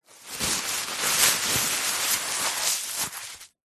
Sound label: foley paper thin stuffing crumpled handling rustling; zapsplat